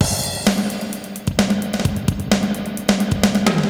Spring Verb HR-16B (130BPM), cyborg beats; musicradar

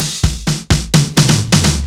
Fills 128BPM, Clubsounds

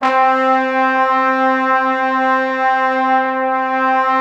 C3, Orchestral Brass; Best Service ProSamples vol.20; AKAI

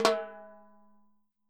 Roland MV8800 percussion; MVPERCUSSION

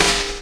Snare, Percussion Hits, Snares

